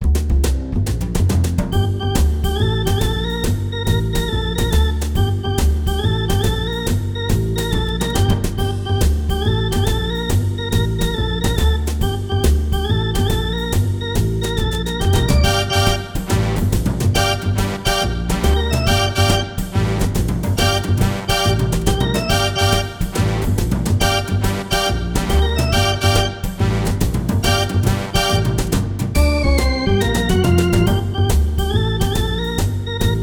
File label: Elevator Music; GoldenEye 007; Caverns